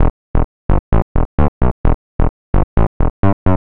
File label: gritty electro synth sequence 130bpm 2 bars